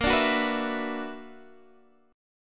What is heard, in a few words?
B-Minor Major 11th